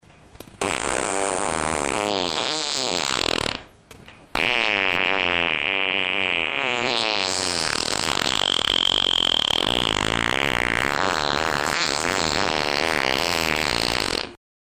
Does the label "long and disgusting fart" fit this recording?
Yes